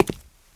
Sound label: concrete step; Golden Crayon Sounds